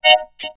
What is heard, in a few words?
Vocode, Vocal Samples